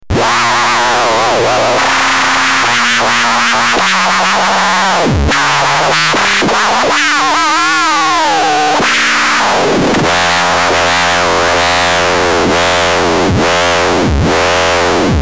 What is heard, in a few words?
noise